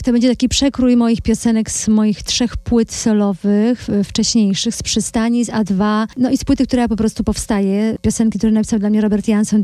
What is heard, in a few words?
viola